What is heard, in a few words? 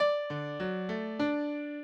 minuet, mozart